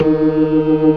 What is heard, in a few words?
ORGAN C3 NO PERC; Keith Emerson Signature Series